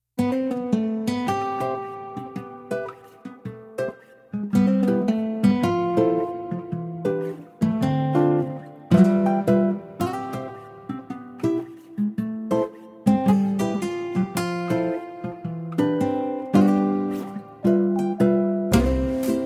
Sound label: comedy loop